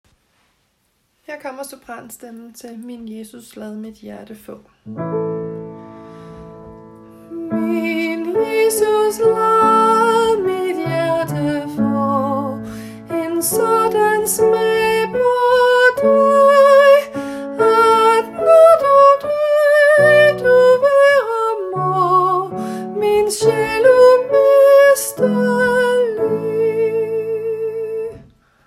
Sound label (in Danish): sopran